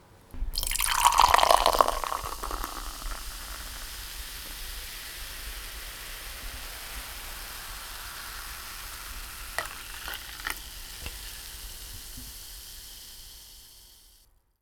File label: water; 炭酸を注ぐ音